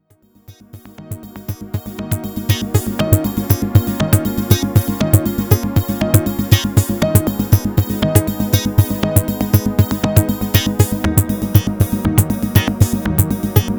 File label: synthex polymix combined